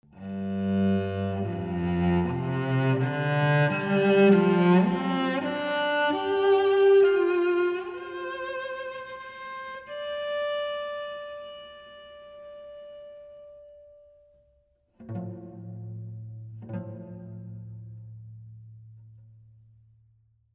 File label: Cello, A&M Chamber; Bricasti